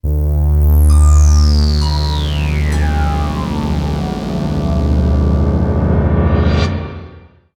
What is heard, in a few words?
Outro